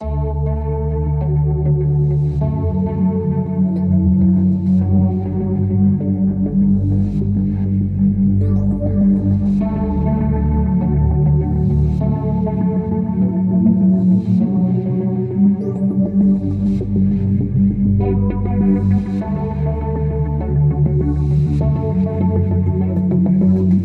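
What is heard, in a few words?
Arpeggio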